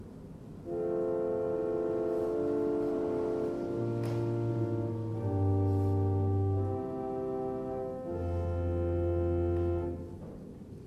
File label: Osmond; Klangprobe Salicional 8' - M2